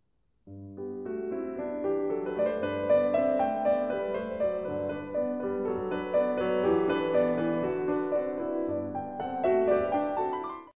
berceuse, piano 4 mains

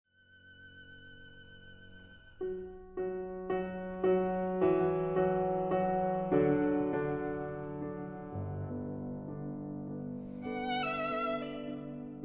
Sonate violon piano; 1. Sans lenteur, tendrement; Sonate, violon et piano (Paris : Heugel, 1927)

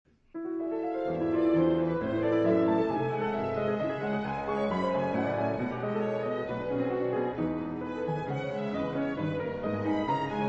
piano Improvisation; Concertante

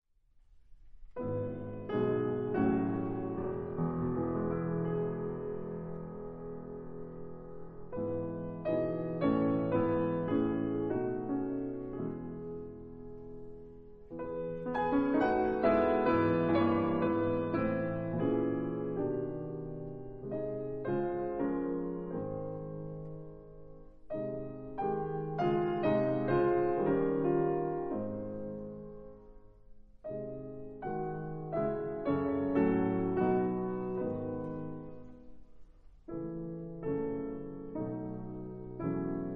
piano Improvisation; Concertante

